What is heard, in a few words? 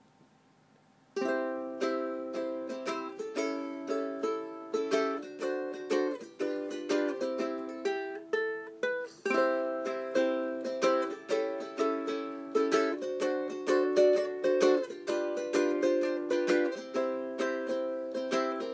mango uke